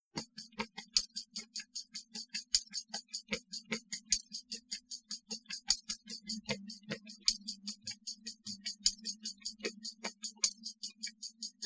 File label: percussive